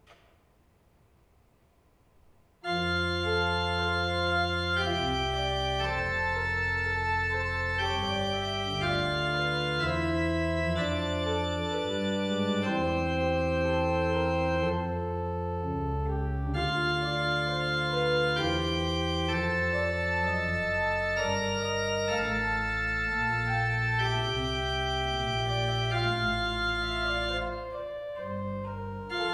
Trio, orgels; Beilen; Willem van Twillert; Psalm42

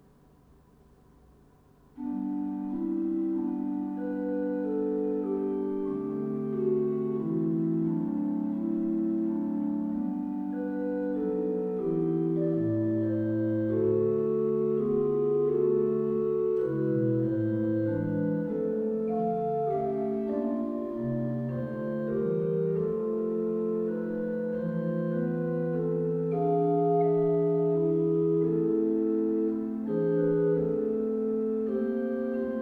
Adagio, orgels

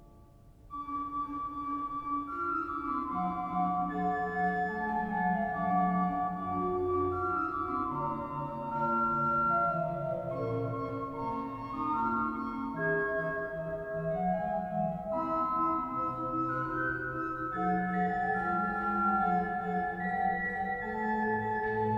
invention, orgel; Diever; Jacques Reuland

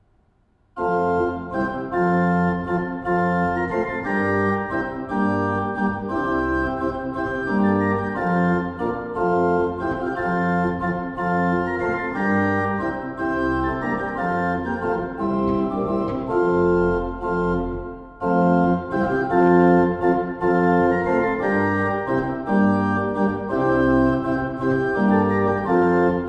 Saltarelle, orgels; Assen; Susato no.2

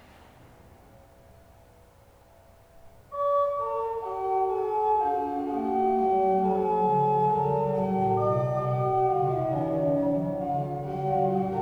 orgel; Sleen

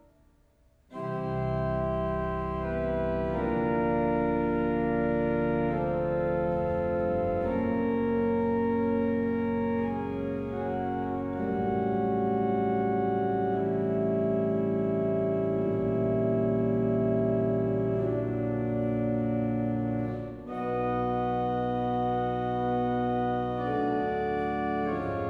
Preludium in C, orgels; Norg

